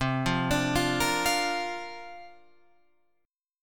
C 7th Suspended 2nd Suspended 4th C down Guitar Open F; C7sus2sus4 arpeggiated